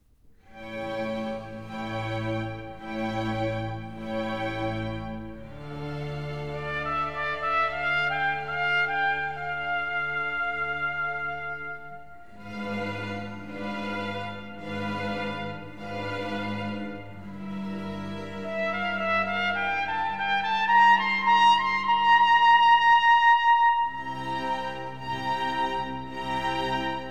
Double Trumpet Concerto for 2 trumpets, strings & continuo in C major; Largo